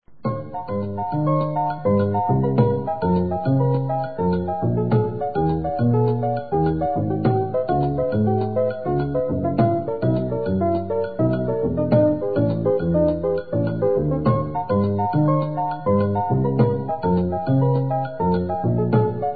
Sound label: Descending Pattern, Piano; Piano diary 2000